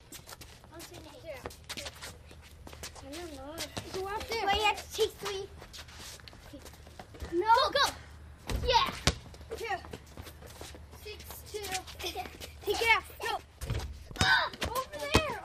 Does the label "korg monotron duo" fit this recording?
No